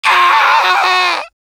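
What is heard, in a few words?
pain critical death, fr; *(Hurlement d'agonie 4)*; Demoman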